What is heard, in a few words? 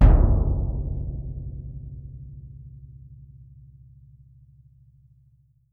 BD2, Percussion; Miroslav Vitous - Percussion & Harp